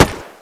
m3 ringout; WAR

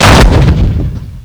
tiger cannon fire; WAR

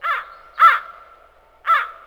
crow

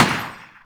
m1 garand; WAR

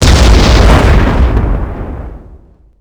small explosion; WAR

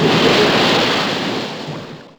water splash big; WAR

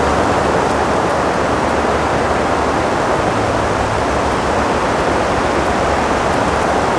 aspen wind distant; WAR

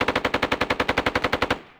mg-42 burst; WAR

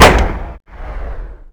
m3 grease gun; WAR

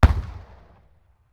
distant gun 60mm; WAR

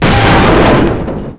lab smash; WAR

